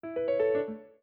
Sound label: Hardware Fail